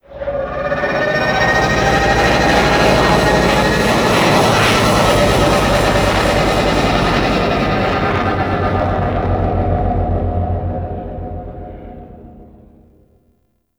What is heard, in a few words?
Level Docking; Vehicles; Warframe; Liset; Nora Liset